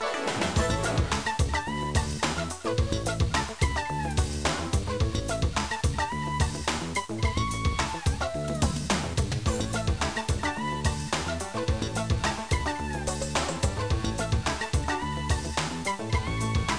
trilha; GEOGRAFIA

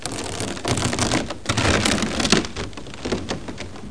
arrastar; GEOGRAFIA

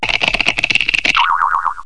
COELHO; 7invasores

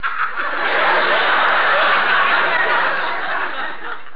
Riso, Risos

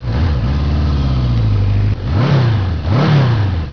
MOTO

